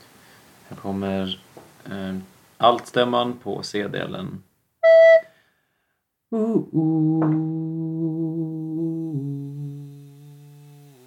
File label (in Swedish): Alt C